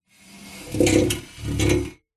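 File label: sounds of bar stool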